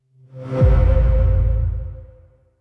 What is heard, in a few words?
Simple Transition